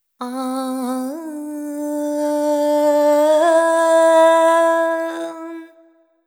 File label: ETHNO FEMALE, Vocals; ethno2